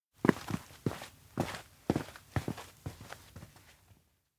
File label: steps indoor tailor; koyomi; anemoi パッケージ版 1.1